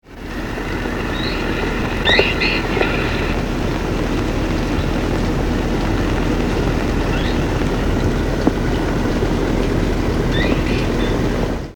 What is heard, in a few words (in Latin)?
Philohydor lictor; R Preto; Itanhaem; SP